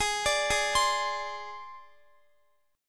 G sharp Major Flat 5th, Guitar; G#Mb5 Chord

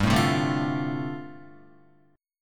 G 7th Suspended 2nd Suspended 4th G, Guitar; G7sus2sus4; strummed; 2,0,0,2,2,1; 4F,4A,5C,5G,5C,6E

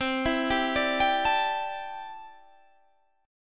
C 6th Add 9th C down Guitar Standard; arpeggiated; JGuitar

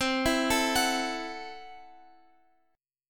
C 6th Flat 5th C down Guitar Open F; arpeggiated; JGuitar

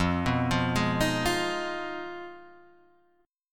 F Minor 7th Flat 5th F down Guitar Standard; Fm7b5 arpeggiated; JGuitar

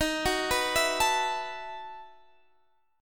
B 11th D sharp, chord

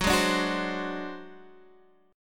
Ab 7th Flat 5th, chord

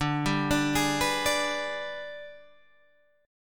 G sharp Minor Major 7th Flat 5th

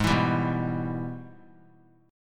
G sharp Minor Major 7th Sharp 5th G sharp down; strummed; JGuitar

